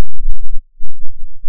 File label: Clink Klank